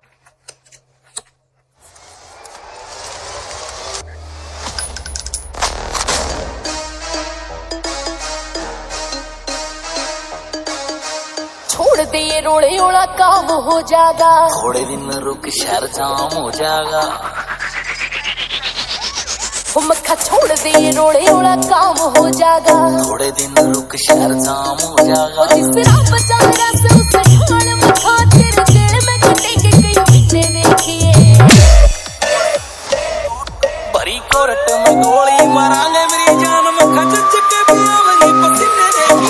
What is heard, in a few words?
Police Siren Full Vibration Remix Song